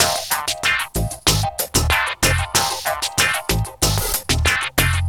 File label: Hiphop, ringtones; Google; NewWave Labs